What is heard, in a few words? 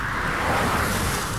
Air